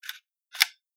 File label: m16a2 check; garrysmod